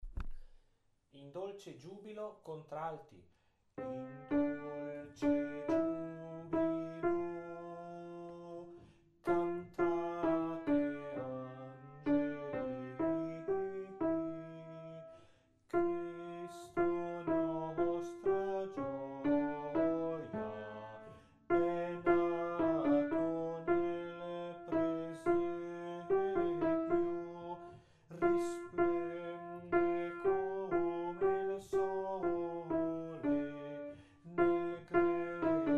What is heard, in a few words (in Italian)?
contralti; in dolce jubilo; bach